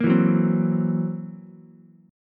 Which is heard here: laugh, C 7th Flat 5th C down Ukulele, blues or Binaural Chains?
C 7th Flat 5th C down Ukulele